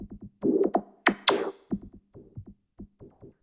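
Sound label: SNARE ELECTRO 140, Techno Drum; Techno2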